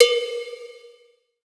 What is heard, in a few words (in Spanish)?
Cencerro, percussion reverb; MARKYDRUMS